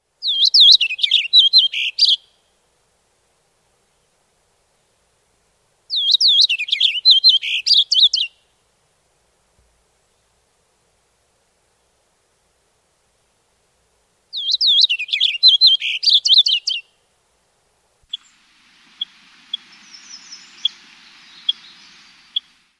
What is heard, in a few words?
purple finch